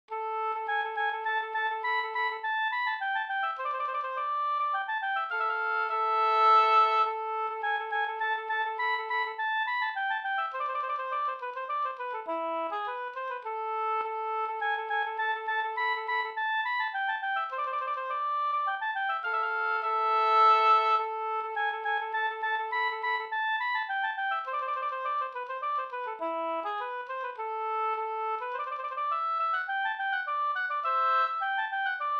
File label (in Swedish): polska Bingsjopolska